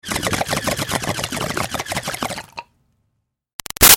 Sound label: Bong Water Pin Out Inhale Bubbles Short; Nature; Big Room Sound